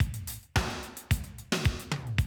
Classic DrumLoops, 110

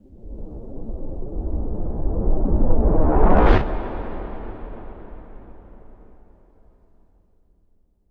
impact rush; M-Audio Pro Sessions; Liquid Cinema

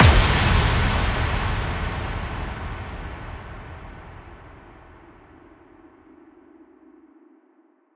FX Kicks

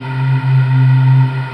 THAI PAN, SYNTH; Q Up Arts; Dream Experience

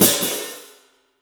CYFX, EFFECT CYMB; 3D Sound; 3D Drums

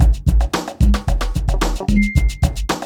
BEAT, Beats 105-150bpm

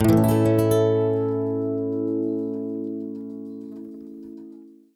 Traditional Guitar 098bpm A end, African Guitars; Quazibeat - African Secret

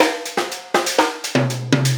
Hi Hat, Tom and Snare

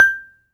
C G-5, SHORT VIBRA; AKAI S-Series CD-ROM Sound Library VOL-7

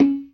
PERC, Synth and Organ; InVision Interactive; Keith Emerson; The Most Dangerous Synth and Organ; CLASSIC C3 4

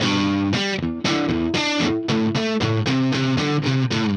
Riff Guitar, Indie Rock; Sonic Foundry (Sony Creative Software); Indie Rock Anthems; Session 06 - Haze

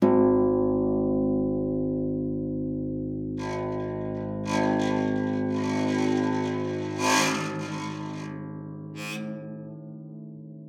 PEDX G1, harp; Xsample VOL-8; HARP+FX

